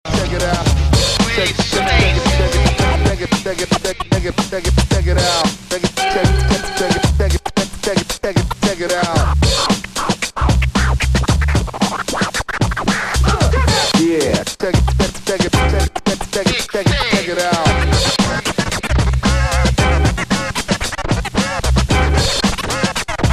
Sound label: Scratch Track; urban vibes